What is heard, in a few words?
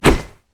basicattack; pantheon